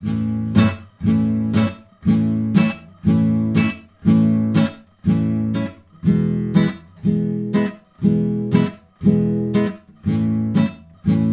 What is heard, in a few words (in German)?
Gitarrenbegleitung; Drei Chinesen mit dem Kontrabass